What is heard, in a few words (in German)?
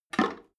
drain valve open regular, badezimmer